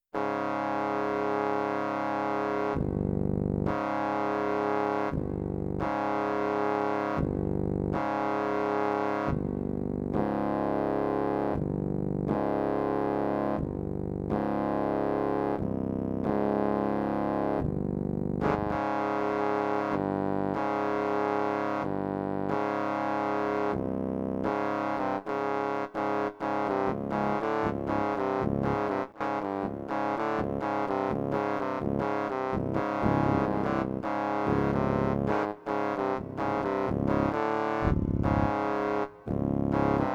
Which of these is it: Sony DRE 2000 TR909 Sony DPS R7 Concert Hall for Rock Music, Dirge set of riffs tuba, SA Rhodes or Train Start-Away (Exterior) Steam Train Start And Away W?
Dirge set of riffs tuba